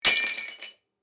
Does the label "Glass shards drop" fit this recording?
Yes